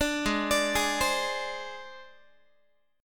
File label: Ab Major Flat 5th Ab Guitar; AbMb5 arpeggiated